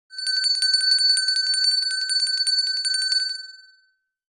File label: Small handbell ring short version